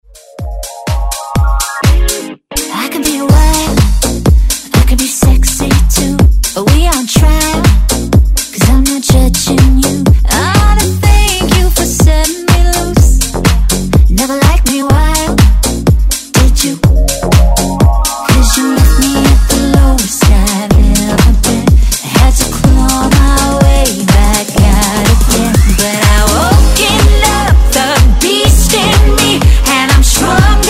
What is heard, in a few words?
house redrum intro